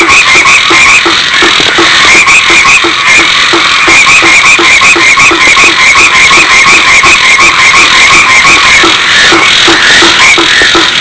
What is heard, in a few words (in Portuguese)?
coaxar abertura